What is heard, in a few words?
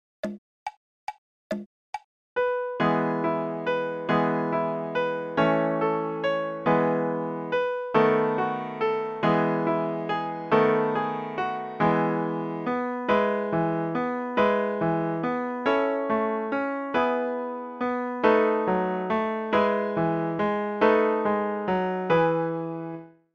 Good Morning In E